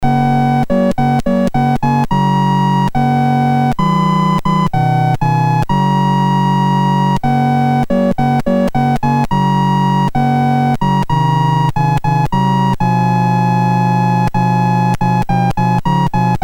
BASIC sid MOS6581R3, GAMES; Train Dispatcher

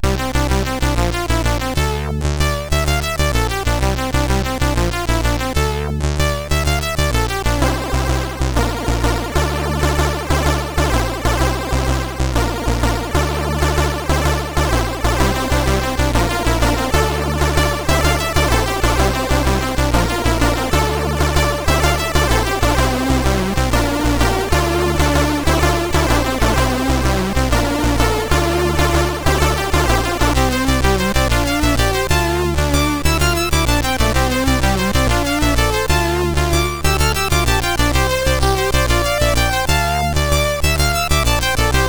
Celebration Theme, sid CSG8580R5

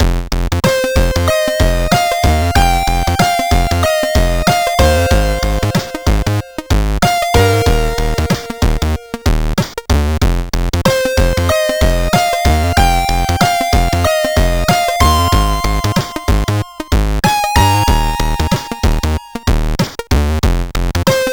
Test Polyphonic sid CSG8580R5; Driver 11

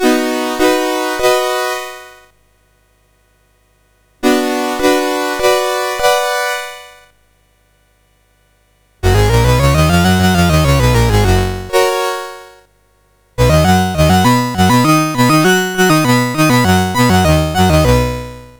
basic scales and triades, MOS6581R3

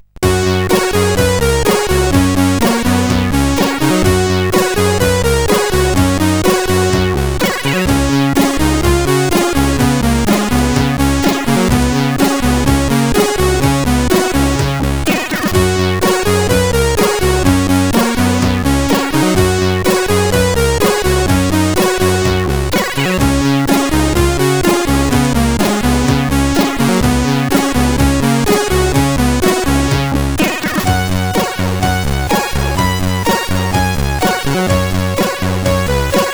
Ultimate Intro Studio advert, CSG8580R5

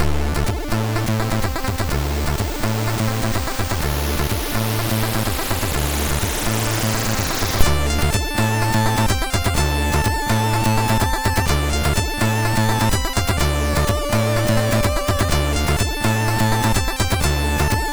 Intro, sid, MOS6581R2

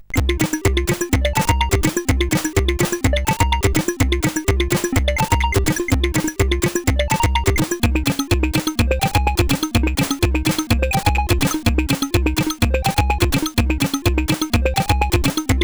Acid, sid CSG8580R5

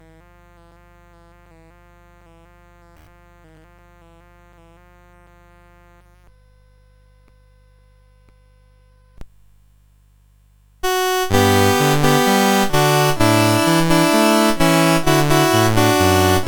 CSG8580

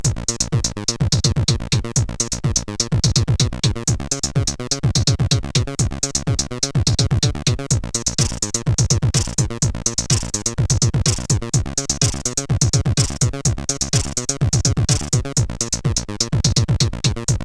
CSG8580

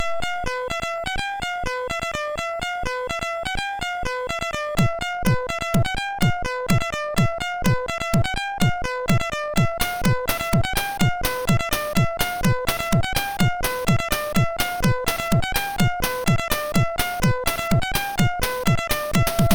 sid MOS6581R2; Technonoise

